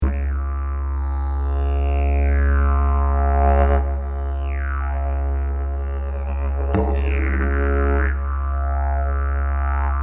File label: didgeridoo